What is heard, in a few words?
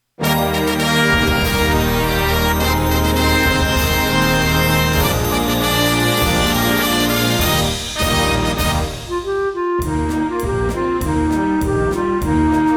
backing track with melody; เพลงประจำคณะพานิชยนาวีนานาชาติ